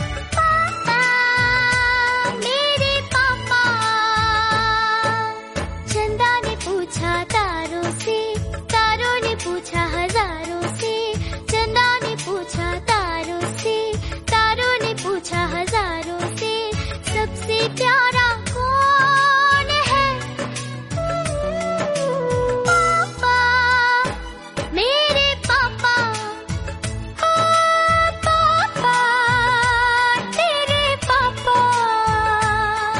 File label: ringtone female version